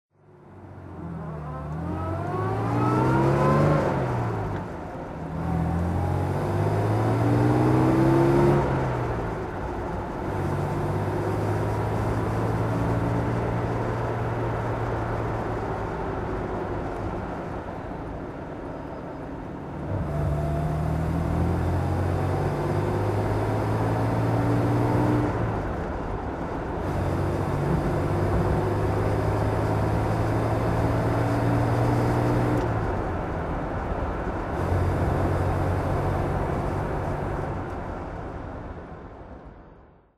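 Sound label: jaguar xk150 38fhc driving, Engine sound driving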